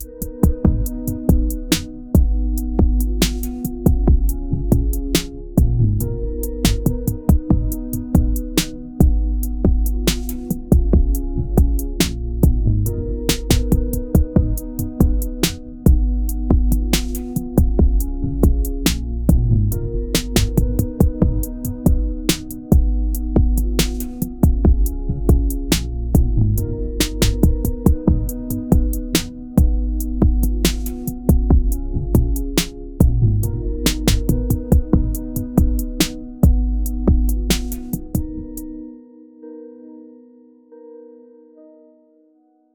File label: soft beats mellow melodie, chill